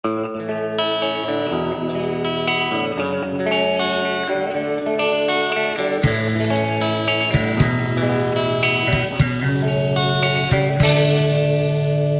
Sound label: guitar tones